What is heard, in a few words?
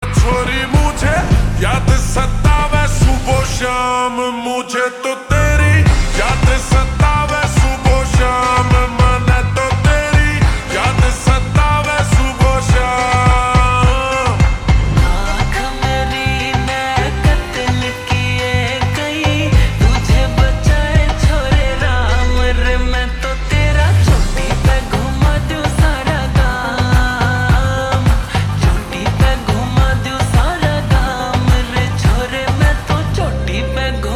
Slow Reverb Version Ringtone